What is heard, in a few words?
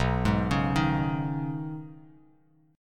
B 6th Add 9th B down Bass Standard; arpeggiated